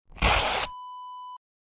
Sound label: paper rip